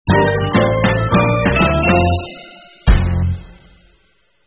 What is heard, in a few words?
Won Game Jingle; Delicious Emilys True Love